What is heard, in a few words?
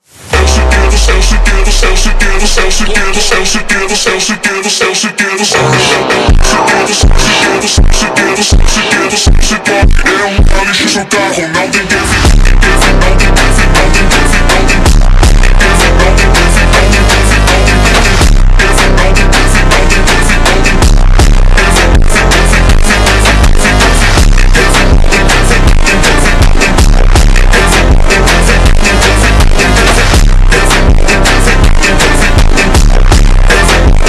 Funk ultra Slowed